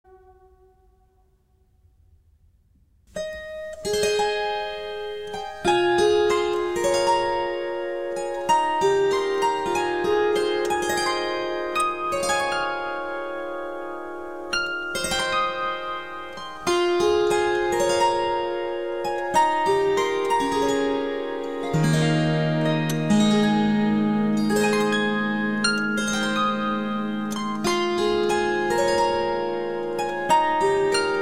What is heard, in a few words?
Auld Lang Syne (harp solo); TRADITIONAL